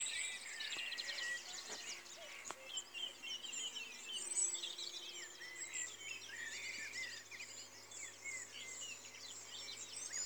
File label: Dawn chorus